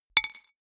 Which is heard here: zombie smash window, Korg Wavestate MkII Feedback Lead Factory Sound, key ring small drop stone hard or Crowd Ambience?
key ring small drop stone hard